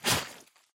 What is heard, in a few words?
xen infest plant bulb; infestation; Half Life Alyx